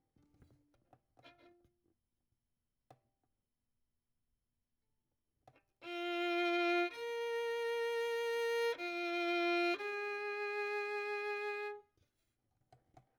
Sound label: Violin; Verdigris Music